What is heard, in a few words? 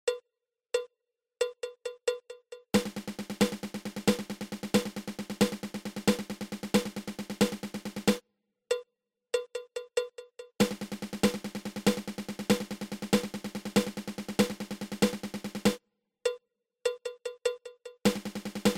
Paradiddle diddle